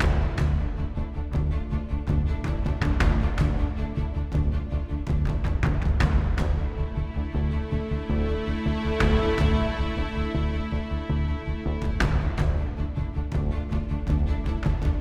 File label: Mood Mid